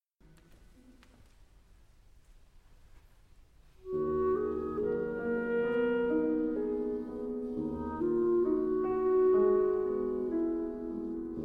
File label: Pieces for Clarinet, Viola and Piano, IV. Moderato